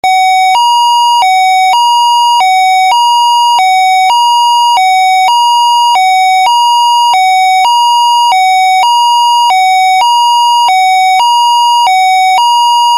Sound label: Siren Tone HILO